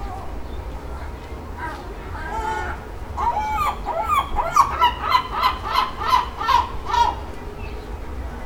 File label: seagull sound